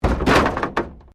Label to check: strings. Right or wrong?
Wrong